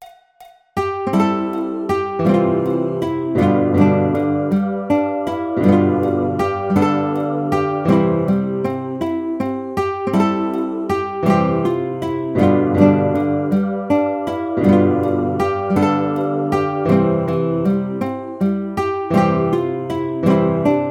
Carnival Of Venice Guitar

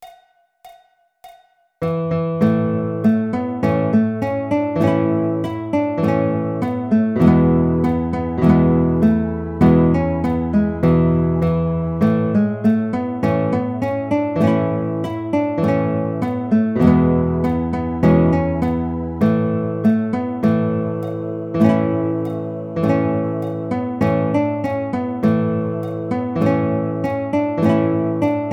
Simple Gifts Guitar

